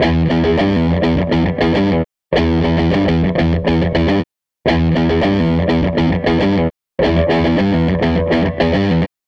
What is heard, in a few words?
Gretsch Guitar Loop